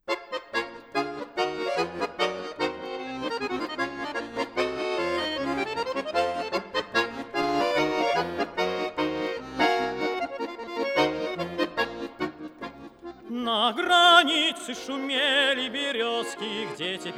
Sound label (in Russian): Хор Валаамского монастыря